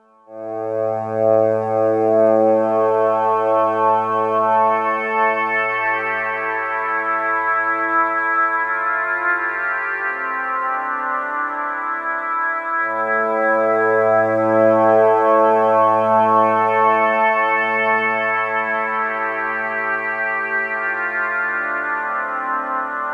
space oboes; Kawai K1; Bank 2 card A

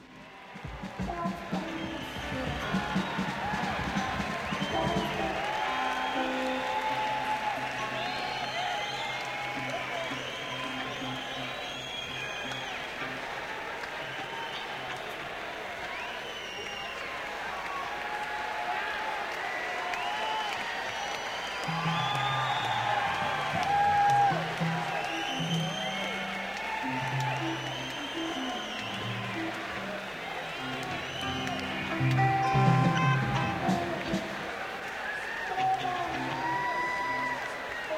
Crowd

